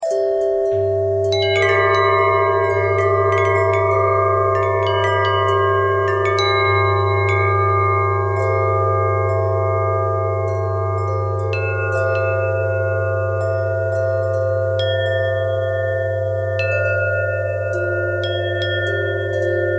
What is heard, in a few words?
bell bell thread pentatonic, bells